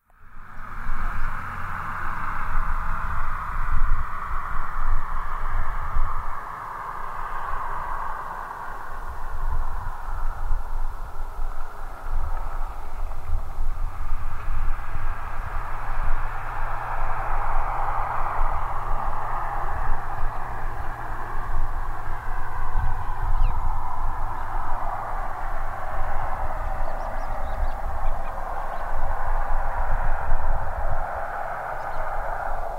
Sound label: prairie; Prairie Chapel Road